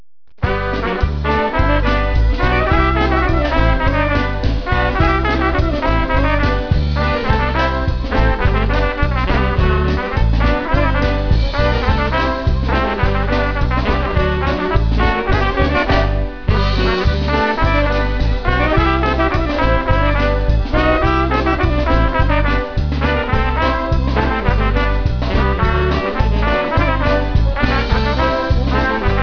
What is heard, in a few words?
Polka